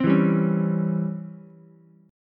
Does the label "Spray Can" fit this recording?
No